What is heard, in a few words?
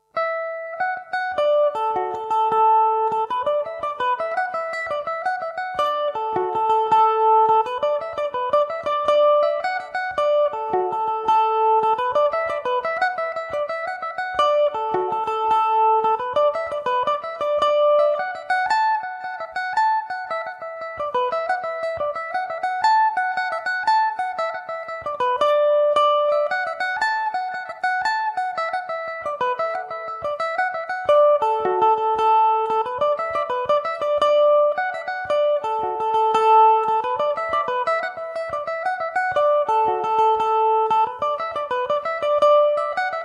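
jig D major, Irish mandolin; bohola jig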